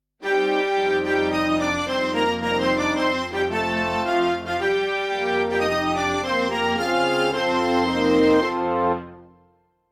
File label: Melody